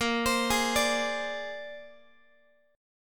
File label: A sharp 9th A sharp Guitar; arpeggiated; JGuitar